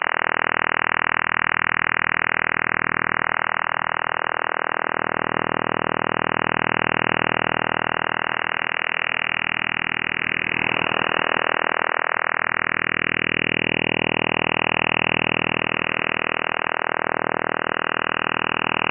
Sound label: othr contayner 40 sps, radars